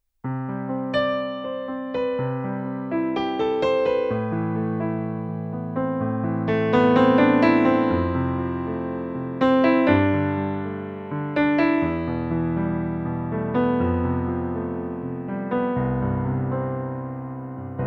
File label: Piano; YDP141